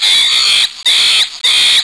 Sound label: monkey